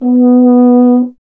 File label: BRS F. Horn, Solo Instruments; Miroslav Vitous; Solo Instruments VOL-2; Solo lnst. II